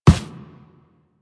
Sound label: Run Default, Footsteps; Warframe; Operator